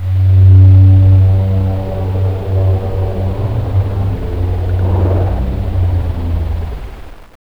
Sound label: Robot Whale Scream; drone whales